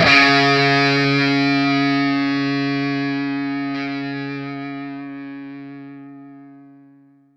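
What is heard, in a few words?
SINGLE C sharp 3, Vintage Blues Guitar ELEC.GTR; USB Soundscan vol.22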